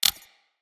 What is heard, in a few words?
Console Hack Button Press